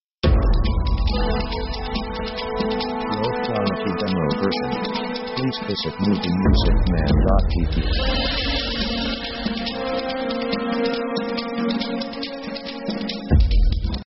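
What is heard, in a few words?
orchestra heavy punching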